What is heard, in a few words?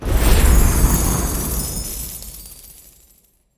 Reward Shower; UI; Driftium